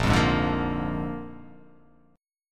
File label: Bb 7th Bb down, chord; strummed; JGuitar